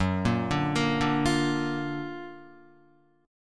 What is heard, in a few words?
Gb Augmented Major 7th Gb down Guitar 4F,4A,5C,5G,5C,6E; Gb+M7 arpeggiated; JGuitar